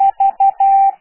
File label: morse code